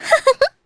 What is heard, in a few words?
Vox Laugh; kingsraid; voices heroes